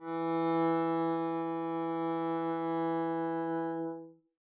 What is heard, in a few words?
arco e3 p down, double bass